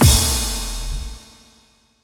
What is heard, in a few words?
crash